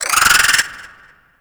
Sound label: Venus Landscape Cave Creature Calls; Warframe; Venus Open World; Ambience